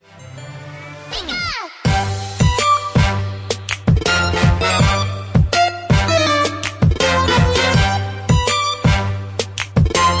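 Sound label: trap, ringtone; pokemon go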